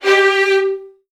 VN 16TH, 1G2; ILIO; Kirk Hunter

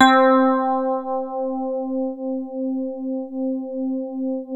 DX7 HARD C4, E-PIANO; Music Store

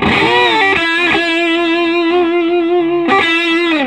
SOLO E, Vintage Blues Guitar

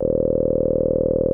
MOOG D1, Synthesizer; Hollywood Edge; Giorgio Moroder Rare Synthesizer Collection; MLTI.MOOG

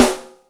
Piclo Rim, Yamaha DTXpress Acoustic Snare

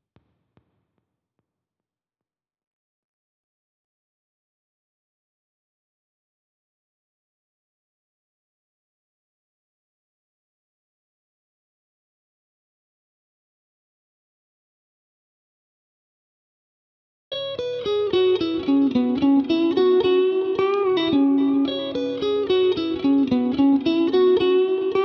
Lead Guitar, 110BPM Emaj; Monosounds; PANDORA BOX!

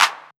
Old Skool House Clap

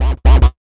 ROCK 132 FX, Big Beat Drumloops

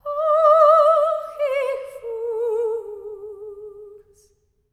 Symphony of Voices SOP. TRAD; Spectrasonics

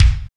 SPD-8, DIGITAL

